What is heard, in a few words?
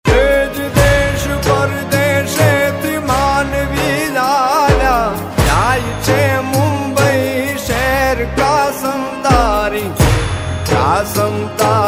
gujarati ringtone